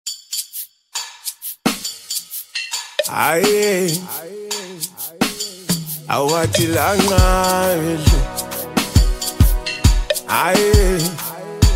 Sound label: Jazz